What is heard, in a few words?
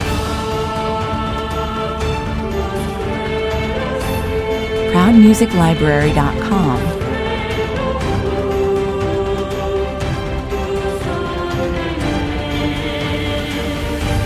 full with choir and solo voice